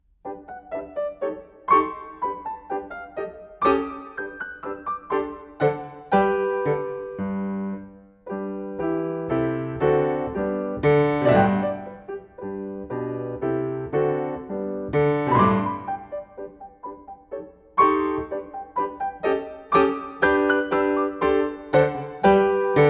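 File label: rain is falling down live piano accompaniment; In Harmony teacher resources; EYFS and Key Stage 1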